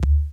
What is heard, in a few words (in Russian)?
knopka suhaya nizkii korotkii priglushennii